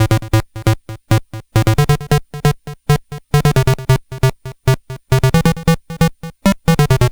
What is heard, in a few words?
Game Boy Bass FX; Nintendo Game Boy